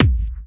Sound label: kick; thirtydollarwebsite